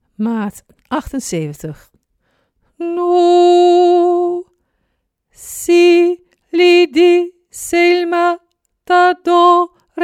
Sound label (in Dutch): Bas